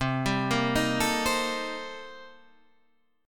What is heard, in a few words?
C-Minor 13th-C-down, guitar; arpeggiated; JGuitar